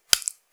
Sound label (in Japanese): ぱちゅ音単発, 激しめ